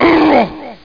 death; Games World; Quake; aamoon; PLAYER

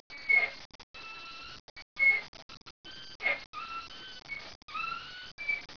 swing; 12000 Graphics Pack - A Complete Graphics Collection For Your PC

